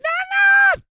SING, World Voices, CARIBBEAN

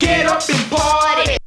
VOX; Zero G; Man Machine

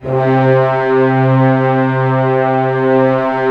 ORC C2 FF, Orchestra; Sound On Sound - Peter Siedleczek's Orchestra

